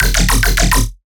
Riddim Bass Shot F (140); Dubstep; Riddim Basslines; Tumble; Ghosthack; Advent Calendar 2019 Day 17; Riddim Bass Shots

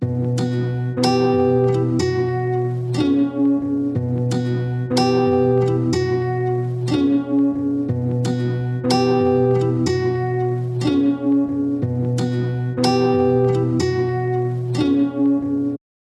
122 BPM B Min Guitar, loop stems; Cymatics; 2022 Melody Collection Platinum Expansion; Schism

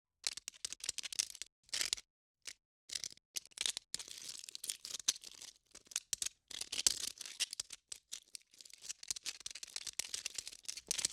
Single Bug Insect Scurry; Fairlight Sound Lib

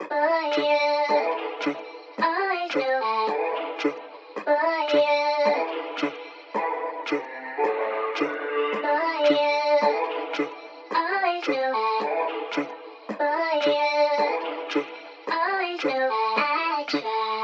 Vocal Loop 110 BPM A Min; Counting Minutes; Cymatics; Mystery Pack Vol 4; Vocal Loops

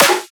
Snare; One-Shots; Drums; Snares & Claps; Ghosthack; Color Bass Essentials

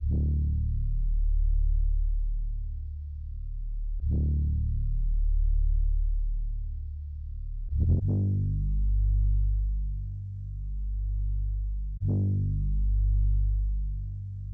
120 BPM D Min Bass; Trap; Loop Stems; Cymatics; Custom; 2022 Melody Collection

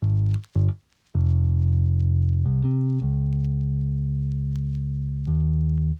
Classic Melody Loop 80 BPM G# Maj Bass; Melodics; Loops; Loop Stems; Cymatics; Eternity

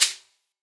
Shaker, Lo-fi; One Shots; Ghosthack; Drum Mega Bundle Demo; Hip-Hop & Lofi Drums

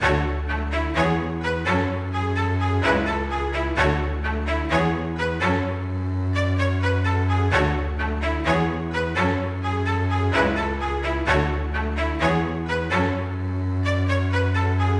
House String Loop - 128 BPM F# Min; Instruments; Loops

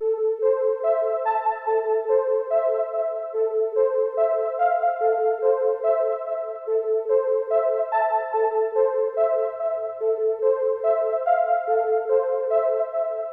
144 BPM A Min Bell; Trap; Melodies; Loop Stems; Cymatics; Trap Starter Pack; Betrayed

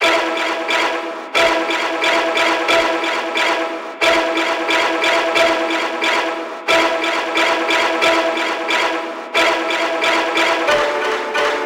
Pizzicato Strings - Em 90BPM; STEMS; Ghosthack; SkyRealm; Mood Kits; Mood Kit 05 - Hands Of Time

